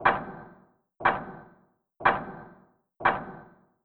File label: clock tick tock long loop